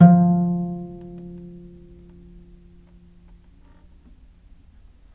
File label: viole pizz F2, Historical Instruments; USB Soundscan vol.23; AKAI